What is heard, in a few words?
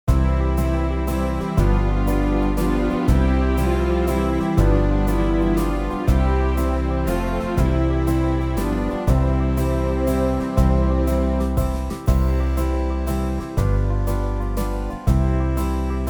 drink to me only with thine eyes backing; folk